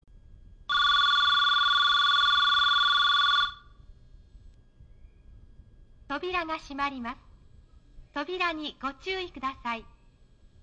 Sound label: standard bell, station; hanshin